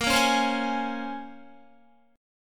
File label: Bb Minor 9th Bb, Guitar; Bbm9 strummed